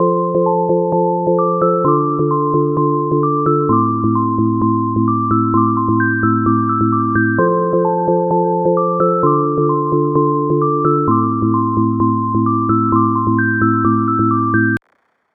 hyperpop upbeat plucked synth melody 130bpm D minor